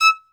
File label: Synclavier Strings, SOLO VI; ILIO